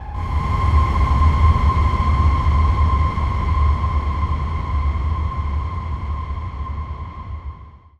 Steampipe Ambient Landscape G 3

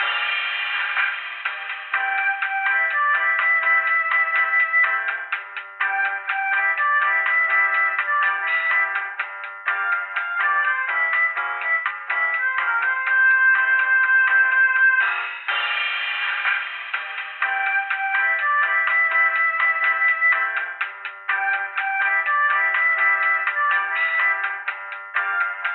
In Elevator

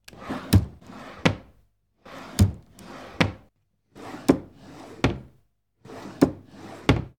Furniture Drawer

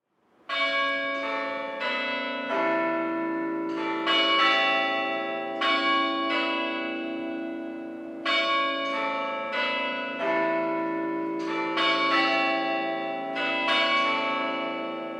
Cobh Cathedral Cork

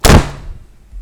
Head Slam

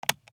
Switch Light

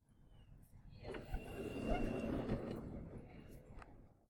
Automatic Door

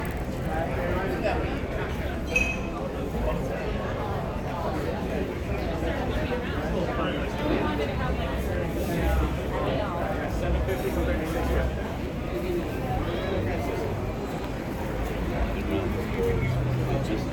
Restaurant Crowd

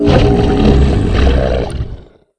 Monster Taunt

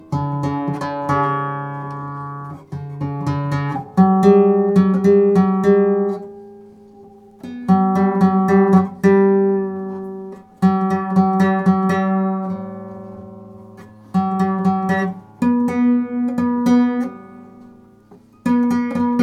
Classic Guitar Tuning

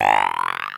Grunt Croaking Scratch

